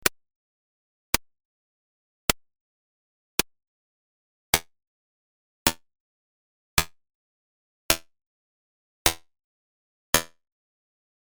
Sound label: String development